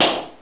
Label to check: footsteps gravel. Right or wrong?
Wrong